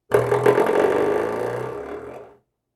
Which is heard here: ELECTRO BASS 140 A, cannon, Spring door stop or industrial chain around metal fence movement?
Spring door stop